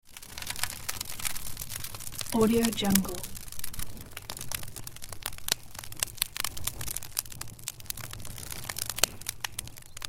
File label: burning fire ambience; alexander-blu